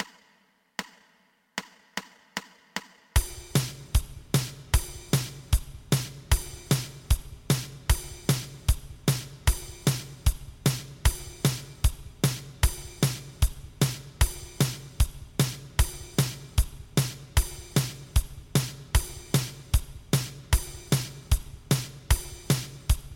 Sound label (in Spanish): 2 pulsos